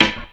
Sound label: rich top end acoustic snare sample F sharp key